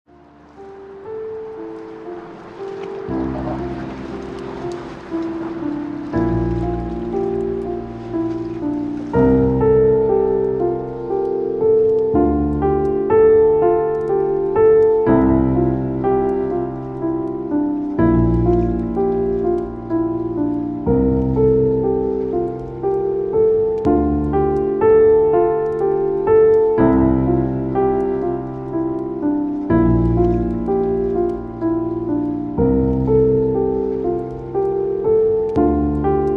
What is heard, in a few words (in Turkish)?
Enstrümantal fon müzik; Çukur